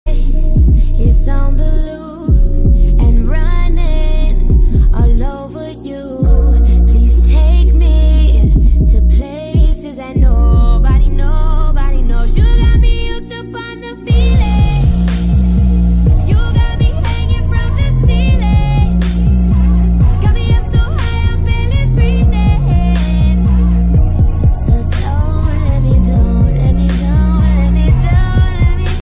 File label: trap trance mix